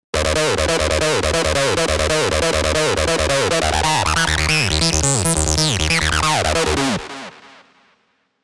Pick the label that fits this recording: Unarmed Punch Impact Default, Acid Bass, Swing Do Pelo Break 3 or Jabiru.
Acid Bass